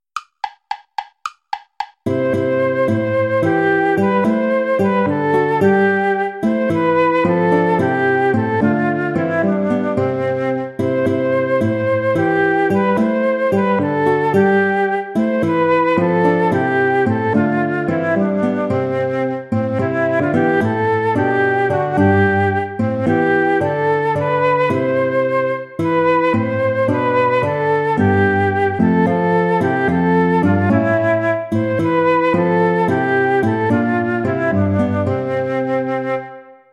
play along without guitar; hassler hans johann leo; ein feste burg ist unser gott

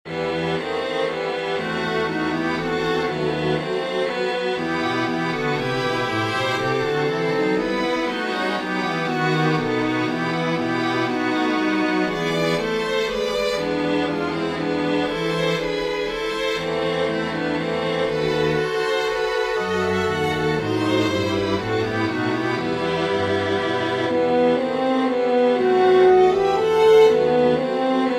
aria for french horn strings

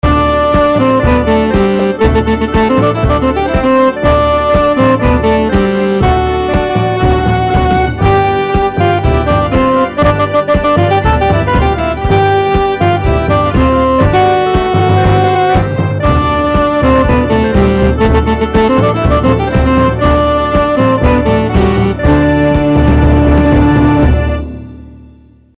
surf rock; traditional

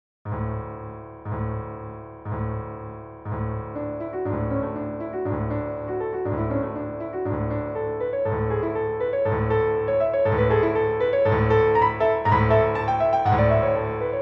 piano; Norwegian Dance; Lyric Pieces

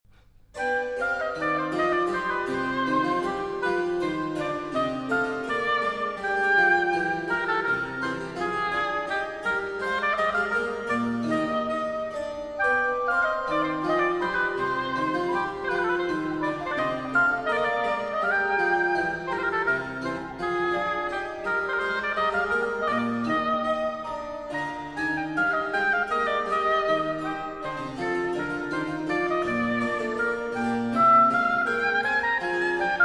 bourra anglaise; haendel georg friedrich

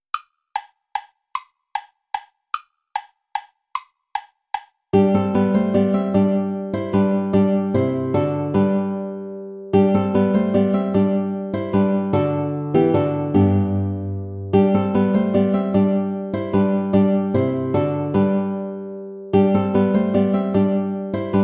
volta play along without guitar; praetorius michael

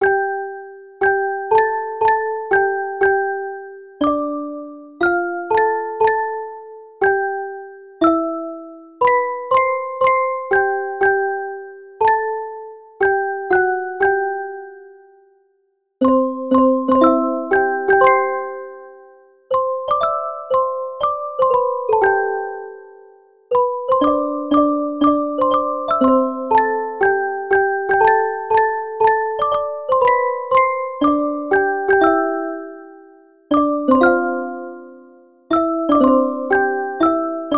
children handbells; handbells arrangments

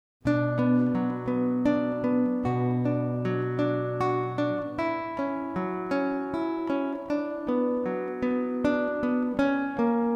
etude mineur duo guitar; sor fernando

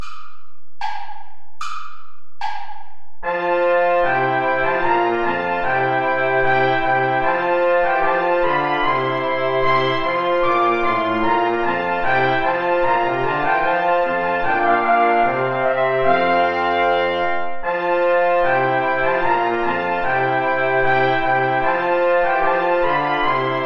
play along wihtout trombone

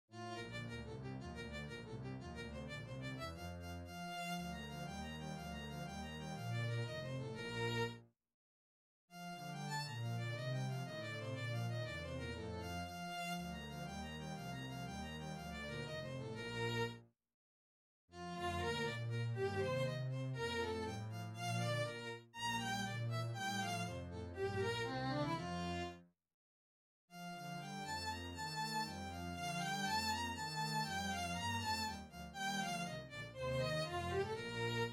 english dance; vanhal johann baptist

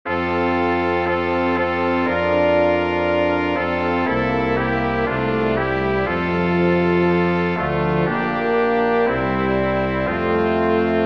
for brass quintet; purday charles henry; god our life through all the circling years

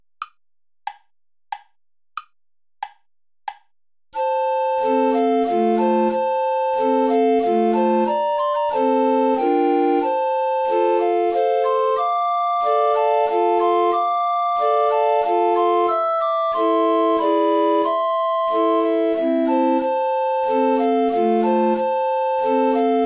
waltz play along without bass recorder; brahms johannes

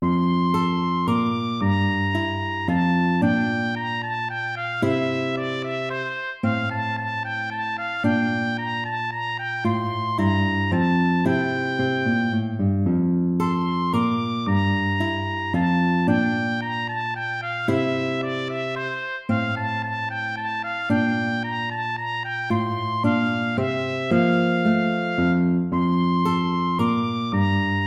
minuet major

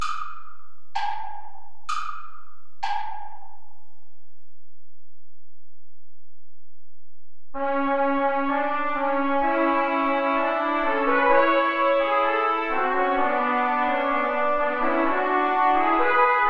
tango play along without guitar; albeniz isaac

